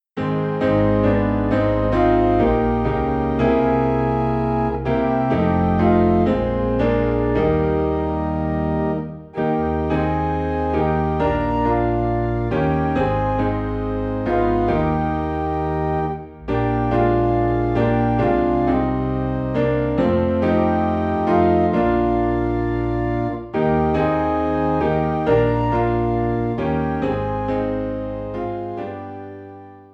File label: Piano and Organ in E Flat; Living for Jesus